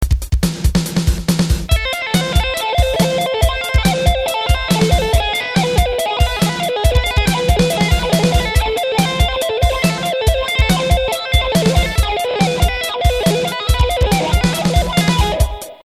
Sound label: rp200 wah